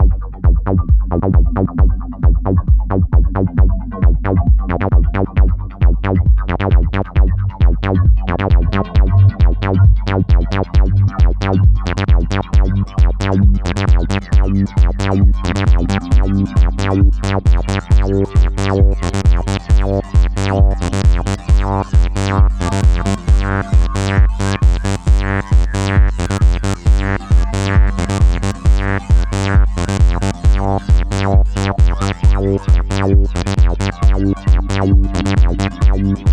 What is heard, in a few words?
Akai S3000XL Dly